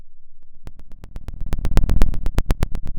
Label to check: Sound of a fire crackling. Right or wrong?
Right